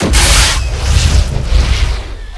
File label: discharge, scanner; npc; Garry's Mod; hl2